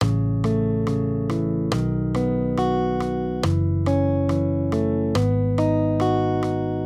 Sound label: maj triads